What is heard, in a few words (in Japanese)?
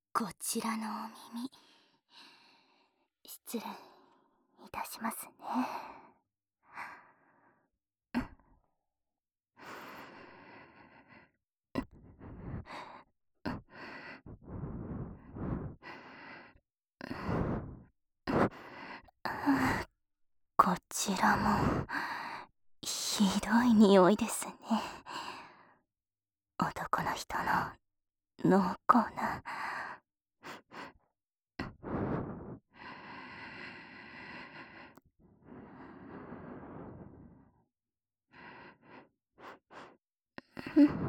お姉さんの耳なめ（左耳）, ASMR